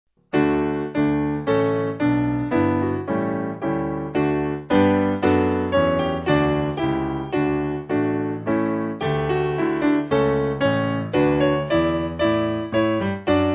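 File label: Piano, Music Backing Files for Churches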